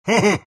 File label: alch laugh; dota; alchemist